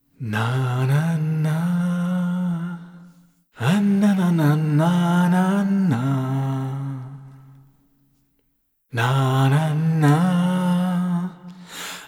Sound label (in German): Vocals adlips FX Niere Mix Saturated; Lewitt LCT 1040